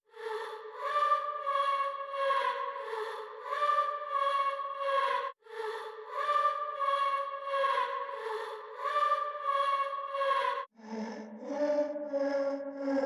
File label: 90 BPM F# Min Vocal; Loop Stems; Cymatics; Lucid